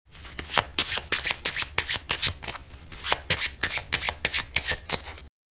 shuffle

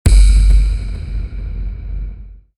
shock on

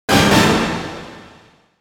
quiz quiz

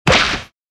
damage

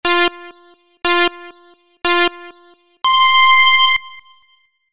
time up

